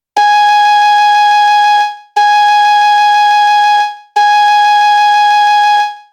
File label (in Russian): 1 oktava sol diez flejta